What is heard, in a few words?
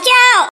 tap